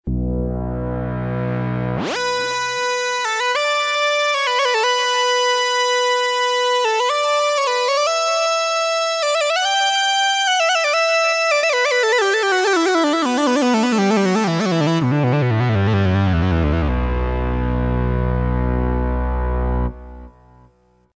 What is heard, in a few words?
moog